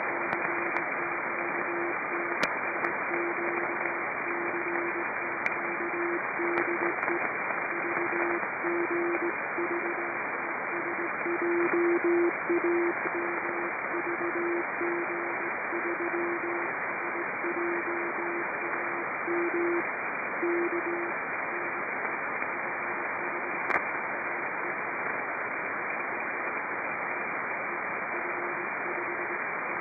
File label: CW, 28035; F5KEE; VG3SJAM